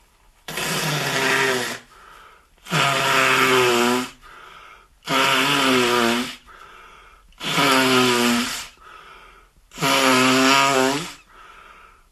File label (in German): eishorn